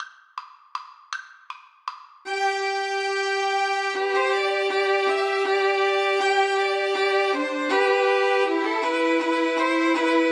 slavonic, Violin Trio

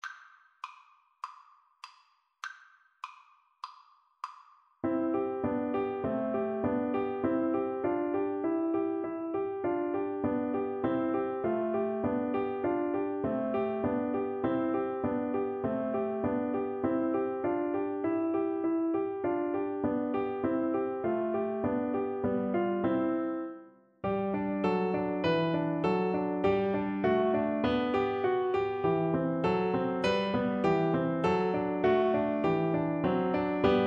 humming song

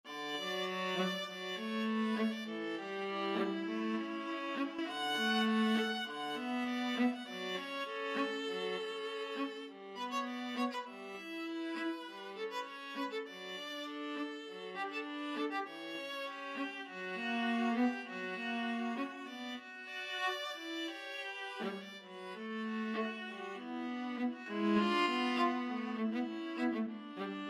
VLA, viola duet; elgar chanson de matin

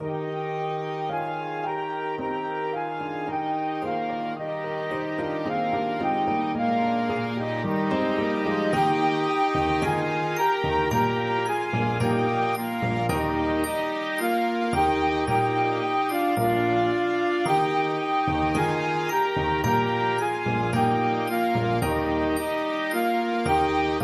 BAND

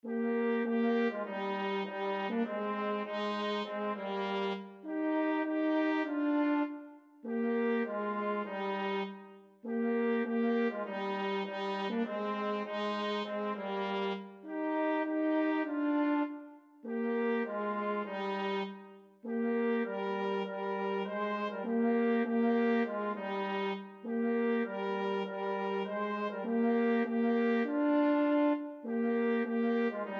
trumpet french horn duet

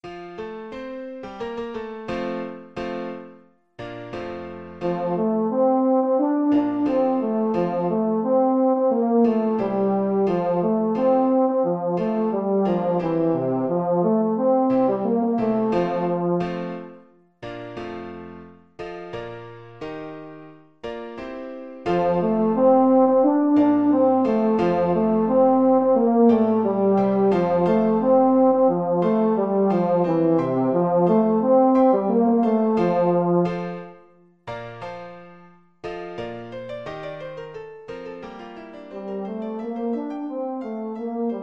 euphonium

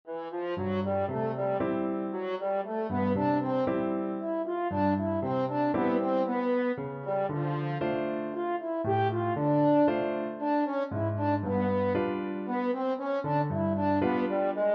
french horn

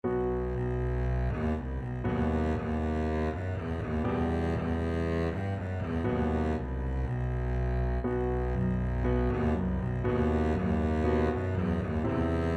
hava nagila, double bass

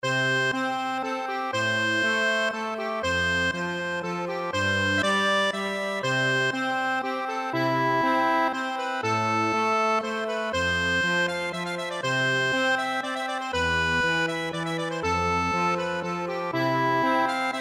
irish, accordion; fairy queen

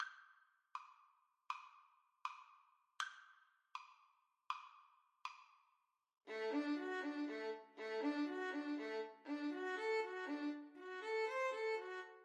Violin Duet

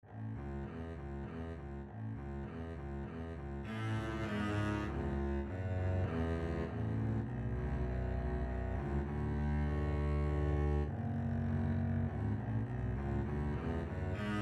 saint saens swan, double bass duet

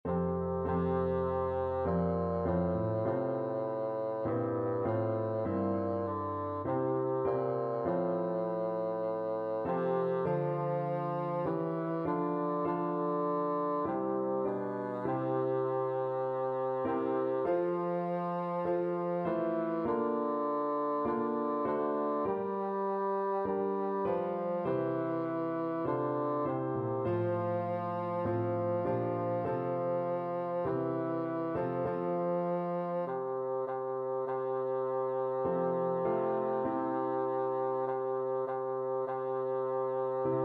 it is well, bassoon

